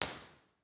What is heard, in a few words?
theatre bformat IR; Individual IR Takes; OPENAIR